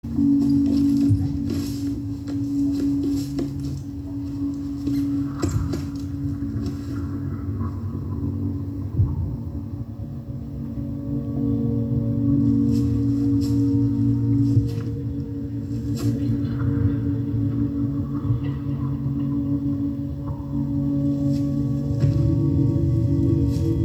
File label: Alien amb